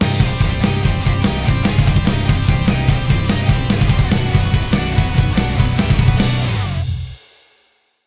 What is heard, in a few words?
halloween stinger